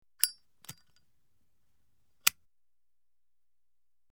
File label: Cigarette Lighter; Zippo Cigarette Lighter Opens , Lights , Closes; Lighters